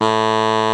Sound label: BARI A3; Greytsounds - Scott Peers Sound Engineering